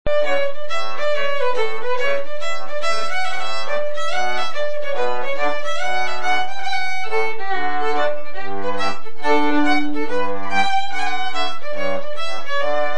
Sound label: hornpipe